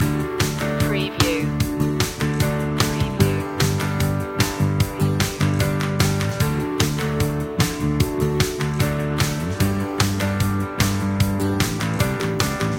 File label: inspiring loop